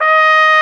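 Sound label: Big Band Fast, trumpet; Roland L-CDC-01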